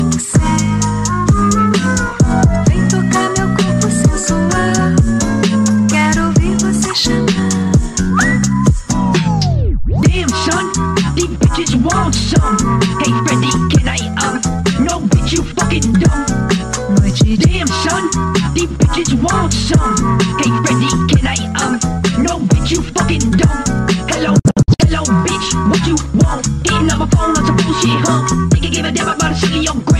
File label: Cha Cha